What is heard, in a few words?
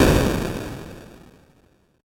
8 bit explosion death